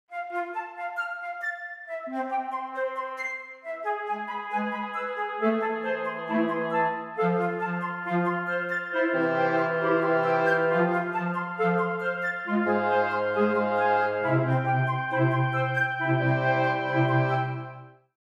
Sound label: woodwinds, acoustic instruments; logic pro